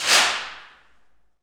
AluminumWall, FX Perc; Rhythm Section

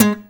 GUI Pull G3# Akus; Best Service Gigapack 2

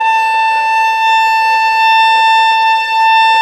VIOLIN A3, Balkanish Traditions

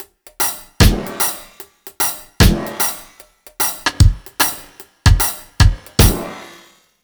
BT, Ragga & Dub Reggae

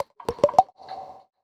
PERCS, Roots Funk; USB Soundscan vol.41; AKAI

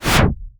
IMP WHOOSH

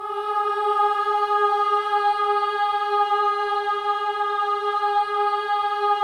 BOYS AHS, Symphony of Voices

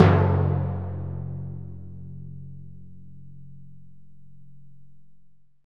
DRM, Orchestral Perc; Roland LCDP03

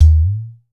udu

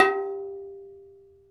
PERC, Dance Mega Drums; 90 s Sample CDs; Best Service

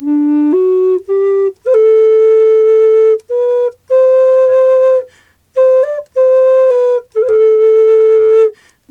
D2 108, Didgeridoo; Big Fish Audio - Didgeridoo and Other Primitive Instruments; FLU 108 BPM

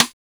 HARD STICK, SIDESTIKS

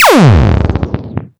Misc Short FX

